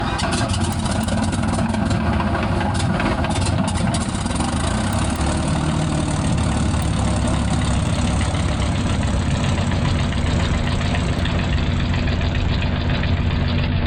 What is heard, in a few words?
P51D startup; mustang